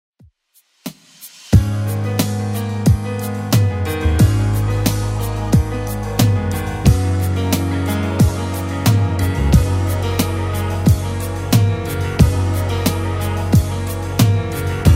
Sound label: 70s Redrum 90 bpm